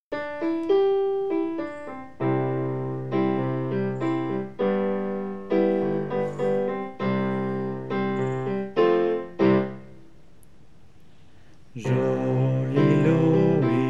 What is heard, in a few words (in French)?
basse tempo lent